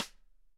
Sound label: Sidestick; Digital Sound Factory